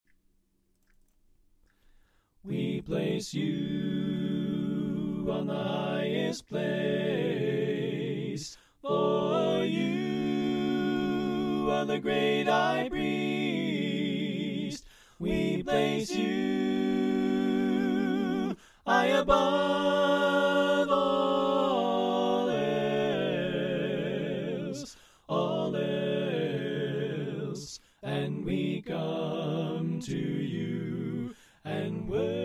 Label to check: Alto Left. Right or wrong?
Right